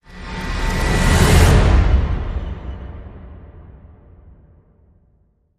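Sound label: Hit Swoosh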